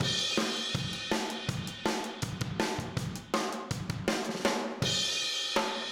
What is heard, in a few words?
Room M, Drums, 162 BPM; Drums from the Big Room The Mixes; Take Your Toms Off; Chorus 04